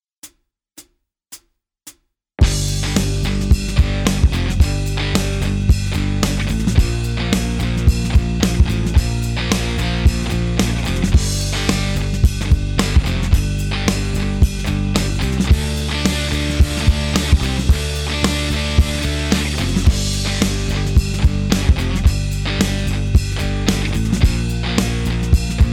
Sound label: Hammer pulls Backing Track